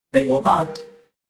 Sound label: ui call end